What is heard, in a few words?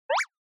Anime Motion